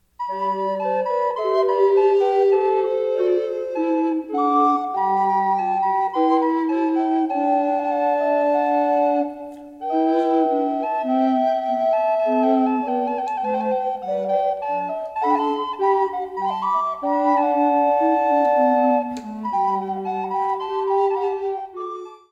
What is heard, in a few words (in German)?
Liebster Jesu SATB